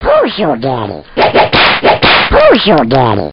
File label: pimp slap